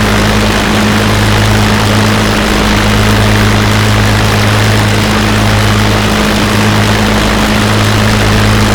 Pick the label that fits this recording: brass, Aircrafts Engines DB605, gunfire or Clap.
Aircrafts Engines DB605